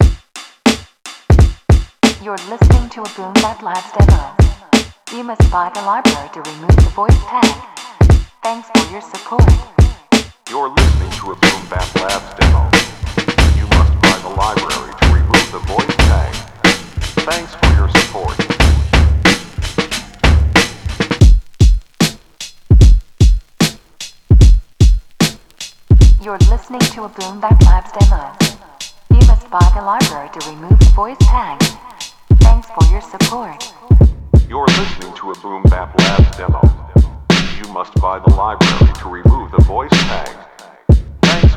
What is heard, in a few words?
boom bap breaks